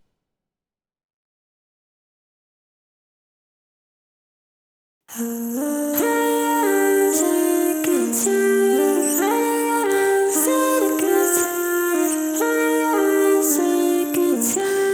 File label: vox side